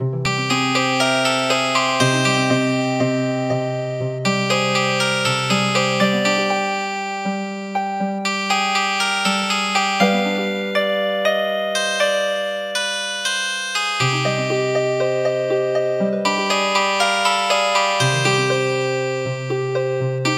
Harp

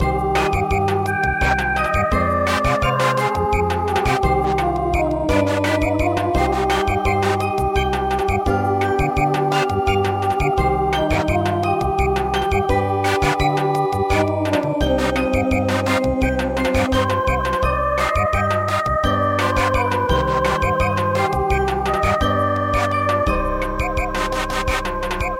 Samba

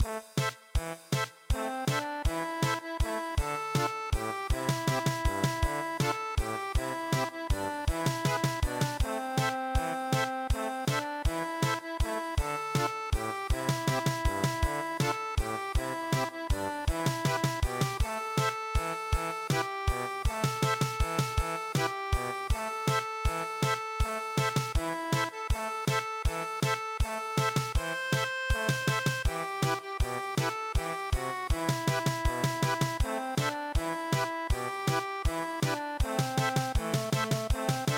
German Polka, traditional; El Pajarillo

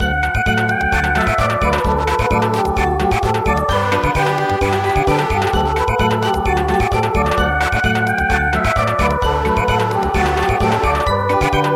Reel Samba; midi; traditional

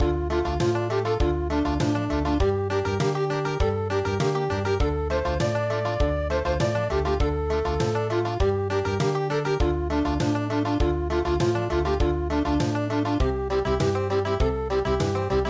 The Ox driving Song country

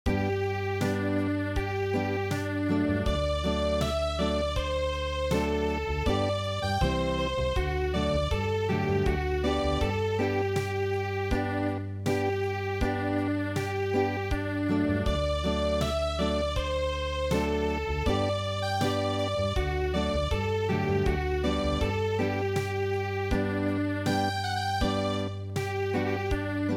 Unplugged; traditional

